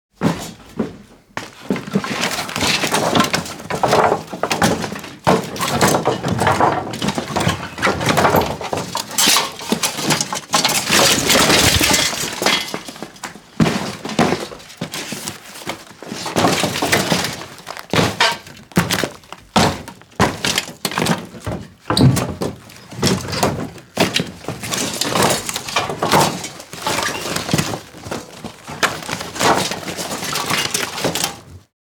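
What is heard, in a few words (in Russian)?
Разгребают завалы спасатели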